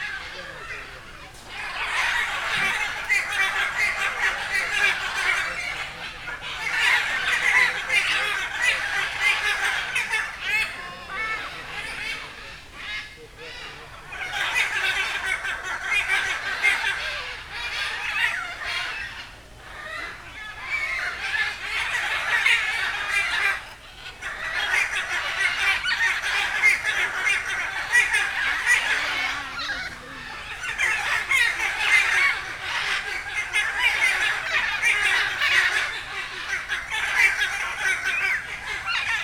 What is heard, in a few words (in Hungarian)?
kek sarga es zold piros papagajok; madarpark